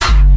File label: Kick Hardtekk